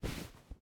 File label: Pillow Drop; Game rip; MiSide